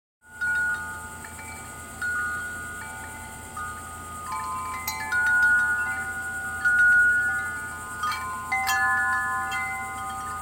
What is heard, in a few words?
windchime